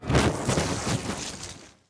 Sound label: Melee Impact Electric; Grounder; Evolve; Kraken